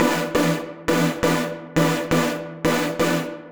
136bpm Lines n Loops Synths, uk garage samples

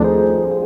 Funky Chord

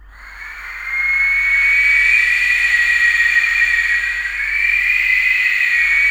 TURBINES, VOCAL WIND; ILIO; Vocal Planet; Jazz & FX

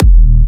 Jump Kicks, Bassdrums; Vengeance - Essential Clubsounds Vol.5

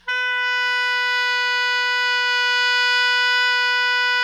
SOP MF B 3, Saxophones; Greytsounds; Philip Wolfe David Crozier's Saxophones

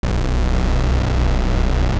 Shocker Sting; musicradar

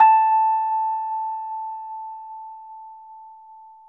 Rhodes 73 Slow; E-MU Producer Series Vol. 5 – 3-D Audio Collection; 3DRhodes

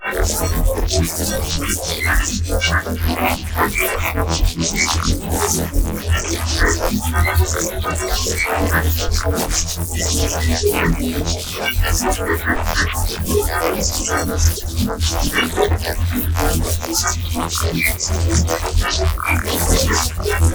Bass Granulize E

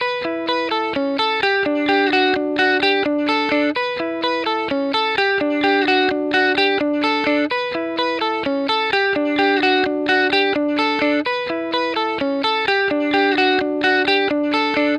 lead E Bpm128, 70s Guitars; Fox Samples; Back to the 70s Guitars

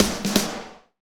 Medium Tekno, Drumscapes Roland

